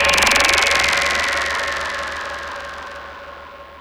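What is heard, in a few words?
SYN right, Production Effects; Best Service - XXL Production Effects; SYN-FX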